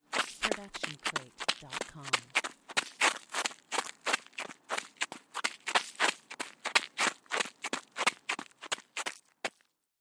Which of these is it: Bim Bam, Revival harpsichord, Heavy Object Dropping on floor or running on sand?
running on sand